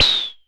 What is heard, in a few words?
Perc Hit, Noise; noise hiss crackle; Noise Perc Hits